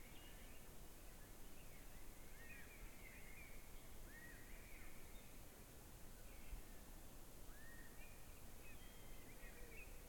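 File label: Ambience Birds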